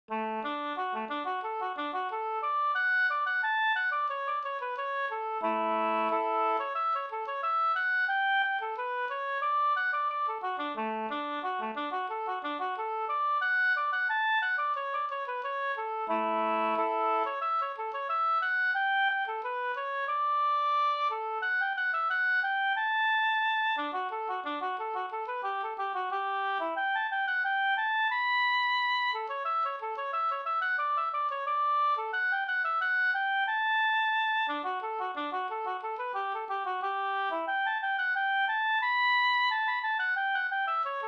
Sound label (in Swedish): ganglat; Dalarna; version enligt Stockholms Spelmansgille, upptecknad efter Nils Agenmark av Paul Bäckström